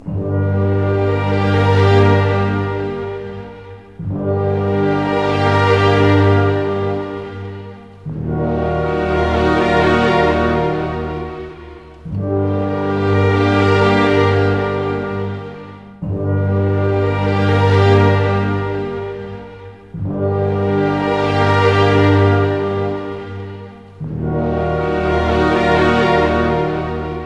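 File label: 60 dark, orchestra; East West; Scoring Tools; Dark Side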